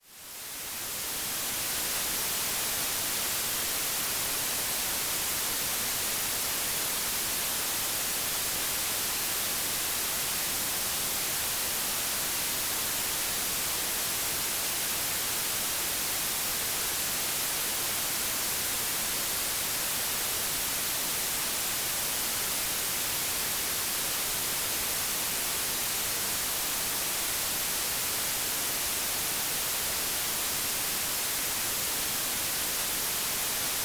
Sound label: White noise; Hvid støj, 30 minutter